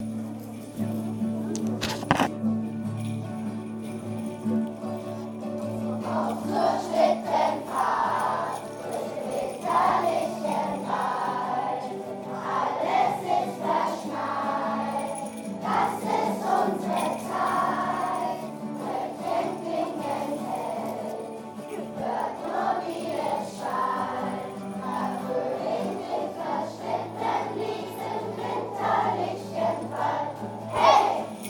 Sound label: Jingle Bells